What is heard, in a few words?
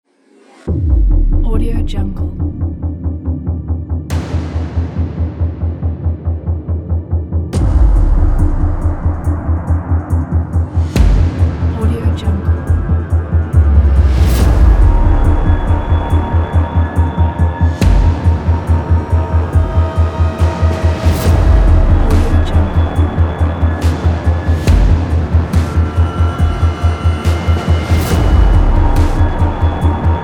horror trailer